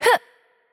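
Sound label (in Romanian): Attack Light, Romanian; bloom minigame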